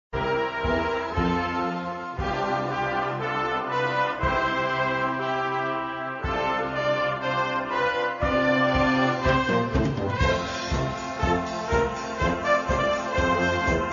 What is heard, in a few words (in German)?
Weihnachtslied Medley